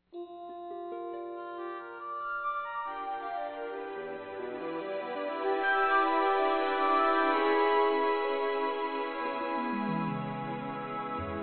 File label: lyre